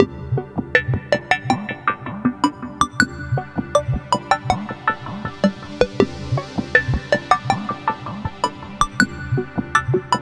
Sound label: Music - Leaderboards BGM; Smart As...; PS Vita; Music Cloudarea - LeaderboardsBGM